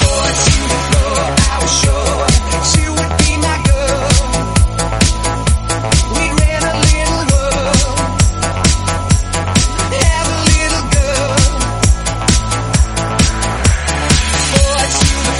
Hype Redrum 132bpm Dbm

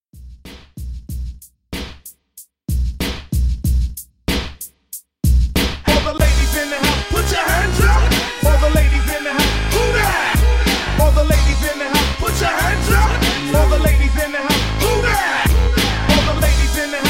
90s Old School Hype

